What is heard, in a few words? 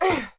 ladder; Daikatana; mikiko